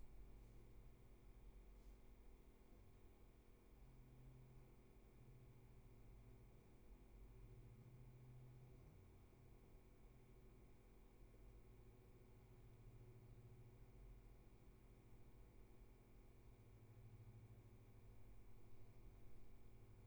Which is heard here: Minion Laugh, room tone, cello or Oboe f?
room tone